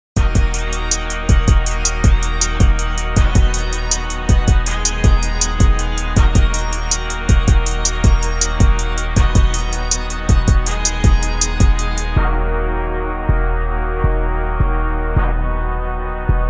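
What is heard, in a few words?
instrumental 160BPM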